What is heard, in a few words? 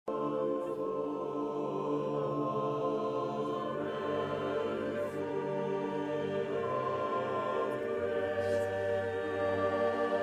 Hymn; ChristWorldClips